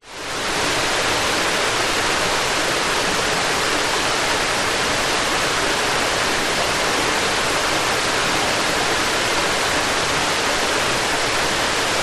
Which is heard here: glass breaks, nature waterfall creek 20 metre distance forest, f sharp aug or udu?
nature waterfall creek 20 metre distance forest